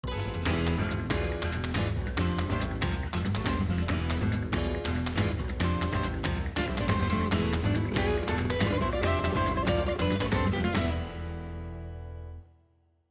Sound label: Thing Hair Dryer; Paper Mario Color Splash